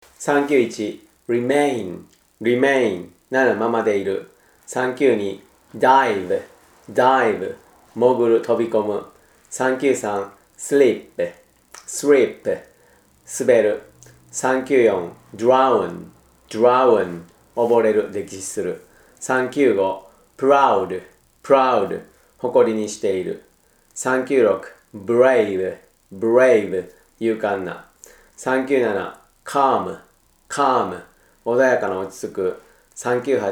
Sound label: tango